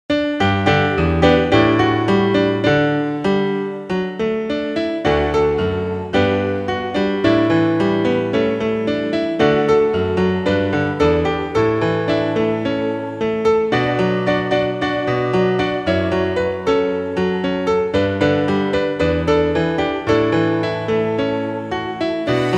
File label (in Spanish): Cantemos Pueblo. Pista con melodia instrumental